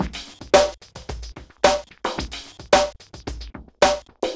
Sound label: house n funk no kick; Sonic Foundry (Sony Creative Software); Ugly Remnants VOL-2